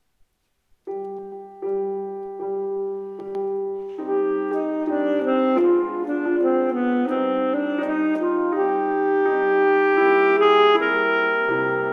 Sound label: moderato, Bel Canto